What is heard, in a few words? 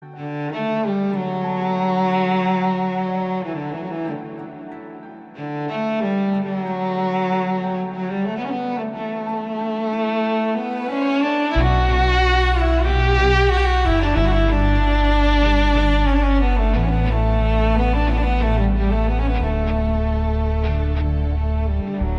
Instrumental BGM Ringtone